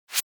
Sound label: Open, Nokia E75